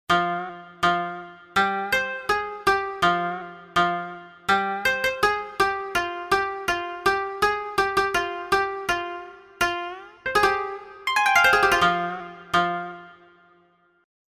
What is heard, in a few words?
Koto